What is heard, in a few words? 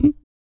Vocal, Blues & Soul; CUICA MAN